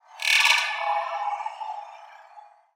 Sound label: attack; barotrauma; Legacycrawler